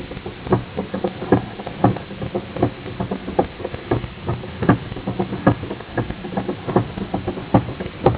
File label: percussion